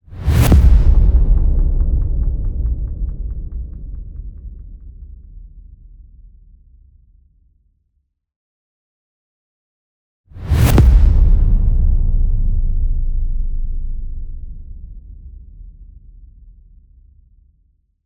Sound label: Incoming Crash